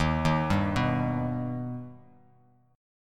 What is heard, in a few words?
Eb Augmented Eb, Bass Standard; arpeggiated; JGuitar